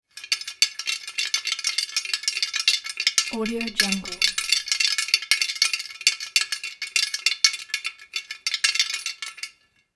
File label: piggy bank shake one coin